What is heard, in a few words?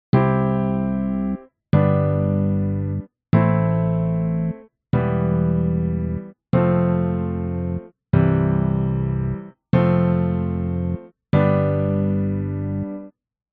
bass